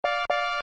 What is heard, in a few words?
bus horn; car-game